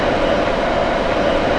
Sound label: Run, train; OpenBVE